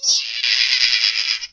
nyaaa, cat

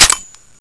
mk22 slide

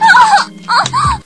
moan

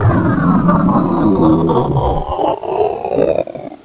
die; coldburn

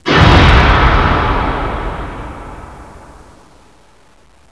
impact

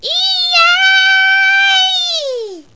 win

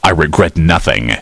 die; postal dude

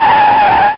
skid

